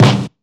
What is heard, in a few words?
boomy steel snare drum sample a sharp key, snare sample tuned to the A# note